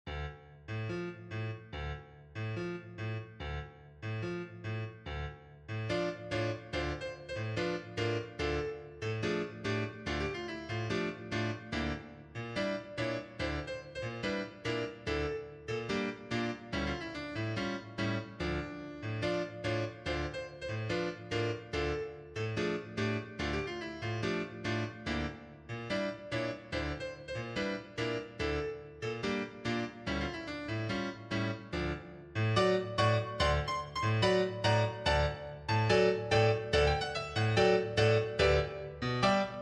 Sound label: habanera piano solo; bizet georges